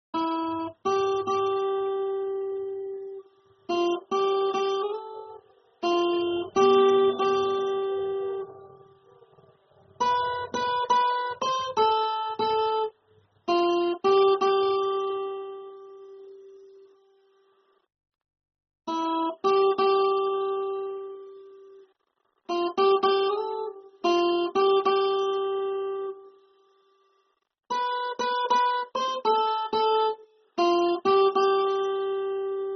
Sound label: Guitar Single String; Taare Zameen Par